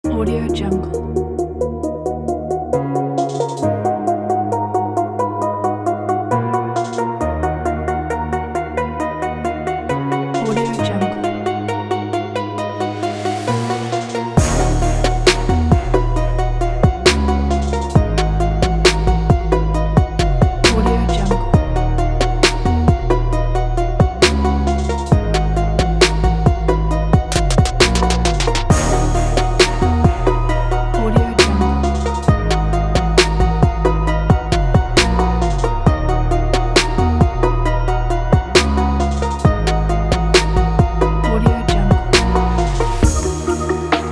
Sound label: heavy beat